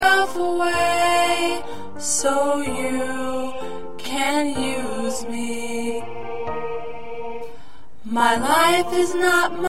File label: Alto, choir parts